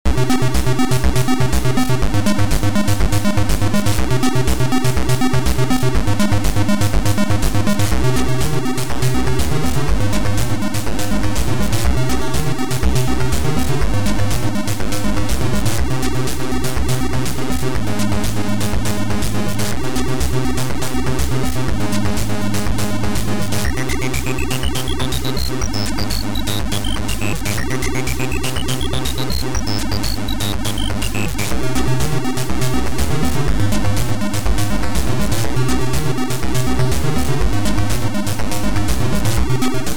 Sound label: MUZAK; Good Night to Death